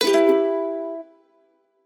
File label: multiline win; Hot Party Deluxe; Wazdan